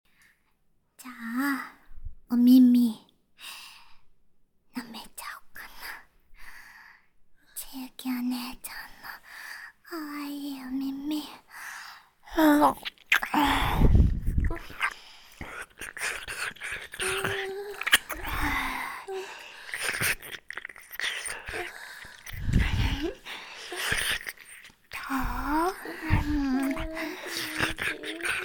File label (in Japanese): 女性向け耳舐め; やよ攻め